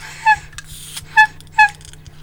monkey; E-MU Producer Series Vol. 3 – Hollywood Sound Effects; Water

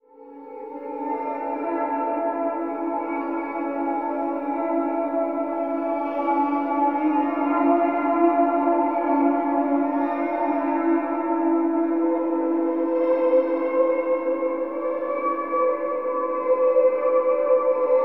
Pluck; Zero G; Malice In Wonderland

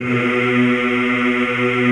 B1, Classical Choir; Best Service; Extended Classical Choir

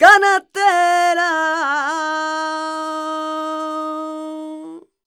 voc d#, Flamenco Female Cantaora; Vocals; ProSamples vol.46

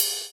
Ride; Equipped Music; Loungin House

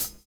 HH, drums dance & groove; USB Soundscan vol.11; AKAI

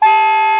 multi, soprano sax; Northstar - New Gold VOL-1

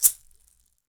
Shaker, Percussion; Roland BK-5

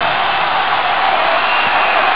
spectators; Amiga Soundtracker sample

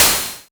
HAT, Yamaha TX81Z synth drums

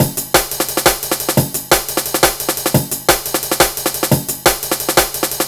classic dnb breaks, B, 175; Scotty

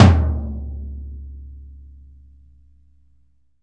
Floor Tom ff; Digital Sound Factory

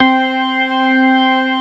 STOPS, organ combos; Keith Emerson Signature Series

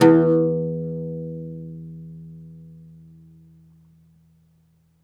OUD E1, Plucked Strings; E-MU Producer Series Vol. 7 – Old World Instruments

